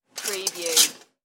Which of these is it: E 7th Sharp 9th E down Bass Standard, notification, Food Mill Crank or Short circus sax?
Food Mill Crank